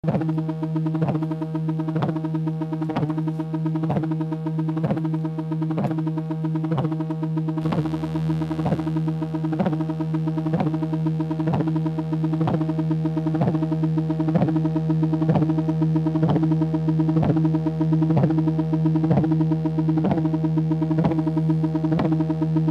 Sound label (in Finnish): pulputus